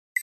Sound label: Flash Beep Abrupt Electronic